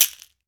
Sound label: Latin Shakers, mexican